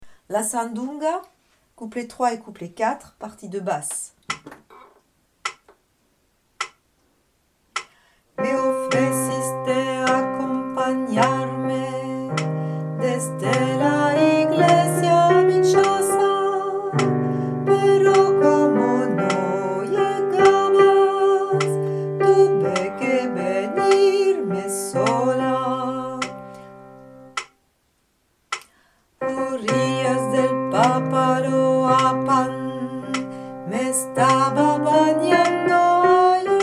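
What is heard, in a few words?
sandunga bass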